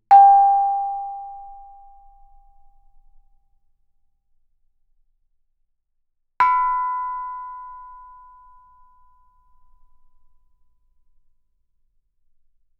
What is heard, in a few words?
Gamelan; Propeller Island - Complete Gamelan